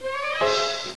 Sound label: hit